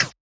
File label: Perc, Percussion; FLPRO